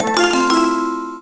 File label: weird